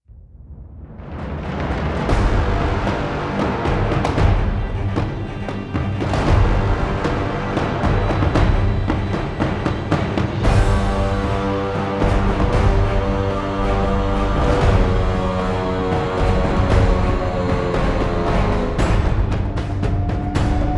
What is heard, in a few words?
Boss Fight Theme Loop; Frozen Flame; windows